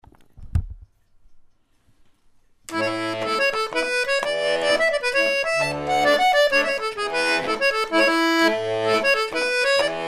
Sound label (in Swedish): Polska från Dala-Floda; autumn Festival 2012